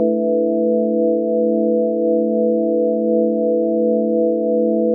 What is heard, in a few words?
shine